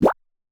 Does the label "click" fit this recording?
Yes